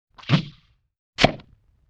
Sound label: Sloop Poink, Comedy Vocals; Vintage Cartoon